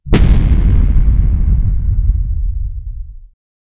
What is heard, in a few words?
flares firing hiss low